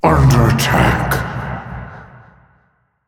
Taking Damage; Grover; Paladins

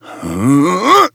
grunt jump

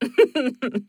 Laugh; Realm Royale; Mage; Wandering Alchemist

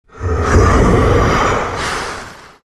enigma laugh

